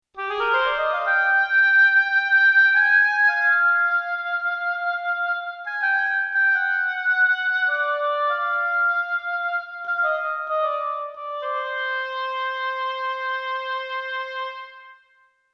Oboe; roland; roland demo cd-3

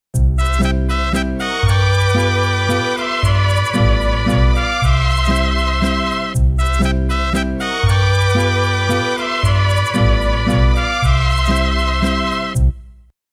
116 Mrich Lp; patch demo; 16-bit, 44.1 kHz stereo; xp-80; roland; roland demo cd-3

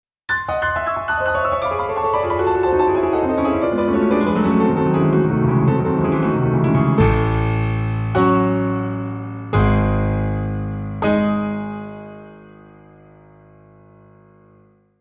Dynamic Grand; xv-5080; SRX-02

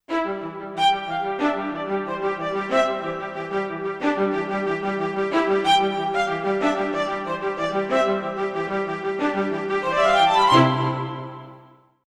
Vln Vla Atk; xp-80; roland; Vln&Vla Atk patch demo; roland demo cd-3

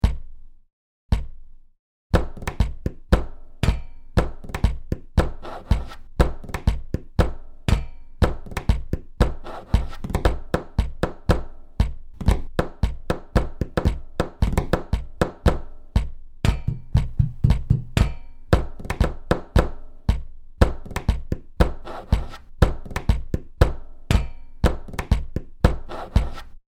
Desk Percussion; fantom-x8

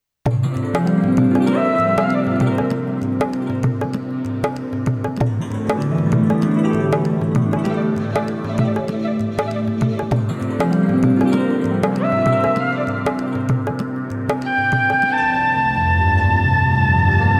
Flute and Accomp; patch demo; 16-bit, 44.1 kHz stereo; xp-80; Flute&Acomp. patch demo; roland; roland demo cd-3

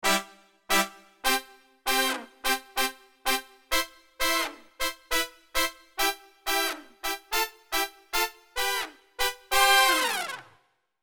Brass Fall; fantom-x8; patch demo

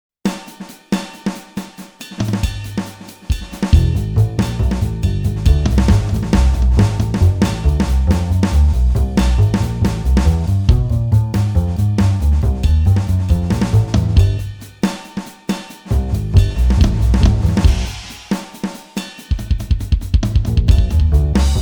bass and drums; xp-80; roland; Multi-patch demo; roland demo cd-3

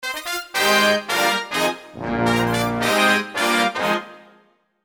Wonder Brass; fantom-x8; patch demo

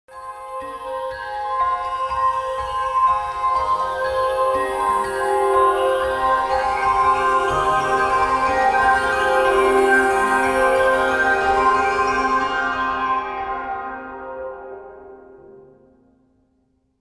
Phantom Pad; xp-80; roland; patch demo; roland demo cd-3

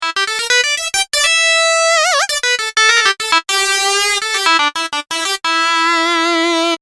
fm SYN-LEAD; fantom-x8

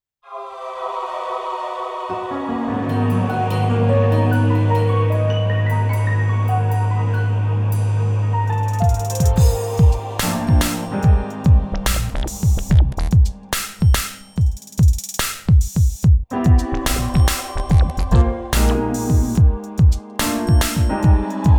session; xp-80; roland; Multi-patch demo; roland demo cd-3

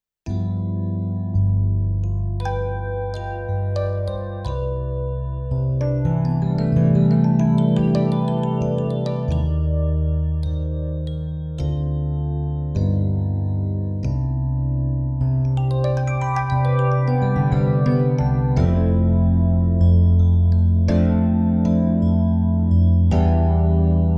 Rhodes; roland; roland demo cd-3

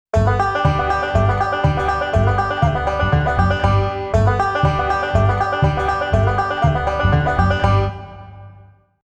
country; Multi-patch demo 4; xp-80; roland demo cd

